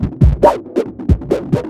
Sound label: LOOP FX, Big Beat Drumloops